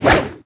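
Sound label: Attack Two Hand Axe; Seer; GamigoNA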